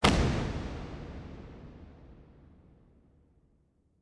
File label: start Freespin; finn